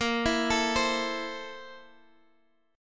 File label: A Minor Bb, Am/Bb chord; Am/Bb arpeggiated; JGuitar